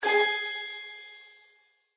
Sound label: find